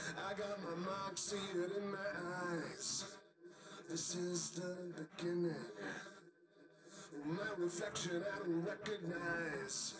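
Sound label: with drums live rec 250 ms 5 cm; SiSEC10; underdetermined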